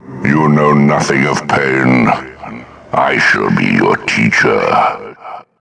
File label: pain